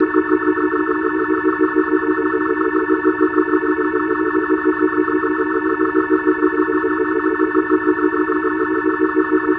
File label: Organ D# Dim; Dance; Organ Licks